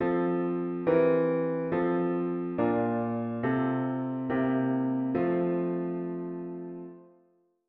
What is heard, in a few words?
Harm Dict diat inv V7 inv bass